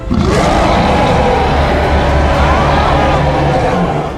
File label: Roar; George